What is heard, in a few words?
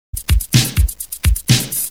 Loop